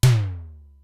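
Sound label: Simmons SDS-9 mid tom, Drum Machines; Factory D2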